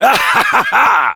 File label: laugh happy; Heavy; fr